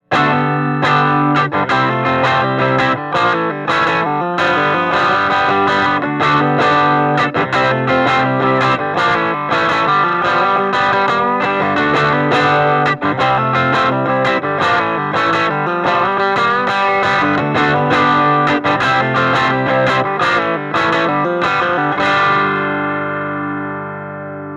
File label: open g rock bridge pickup